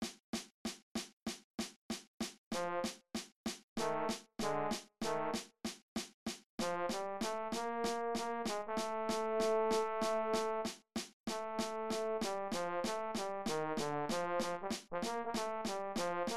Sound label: Just a Closer Walk cut time trombone; senior high band